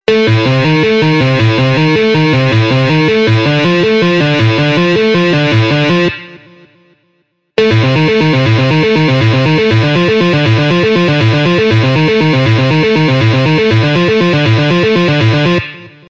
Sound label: tapping sound, guitar